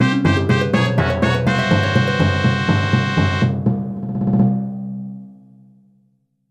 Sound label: fanfare; Open source MIDI music by Fredrik Johansson